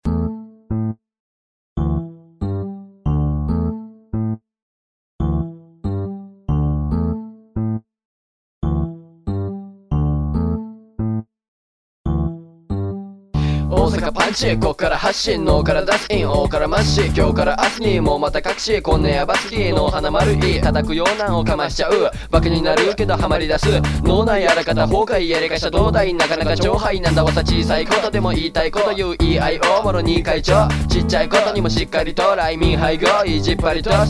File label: fighting